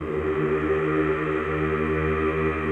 CHO ES1, Aaahh Yhh; Sound & Vision - Gigapack I CD 2 (Roland)

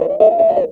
Clavinet; Ambient Grooves

